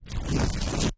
Heavy fabric is torn into strips. Tear, Fabric Rip, Fabric